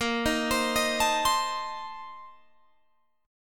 A sharp Major 7th Suspended 2nd Suspended 4th, Guitar Open G